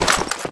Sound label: throw